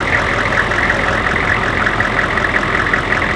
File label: Idle truck